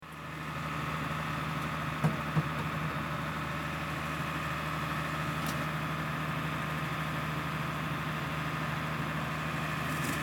Silvester Feuerwehr

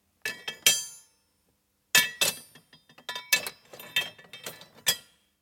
Maulschluessel weglegen

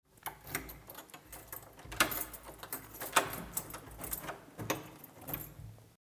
Wohnungstuer

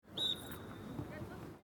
Fussball Jungendtraining Pfiff

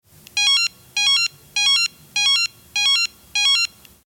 Pieper

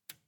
Schalter

